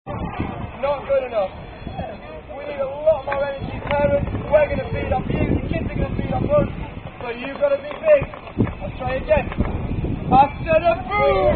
Boom Chica Boom Call And Response; Korean Bunny Korean Non-Drinking Game

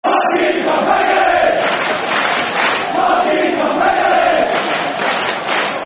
Parkhead, Football Chants (Soccer Songs); Rangers GRFC

